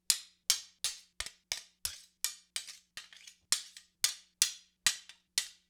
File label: Dagger Norse Sword Blade on Blade, Weapon on Weapon; StillNorthMedia